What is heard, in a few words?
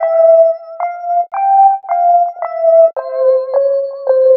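extra lead (spooky); Sonic Foundry (Sony Creative Software); Ugly Remnants VOL-2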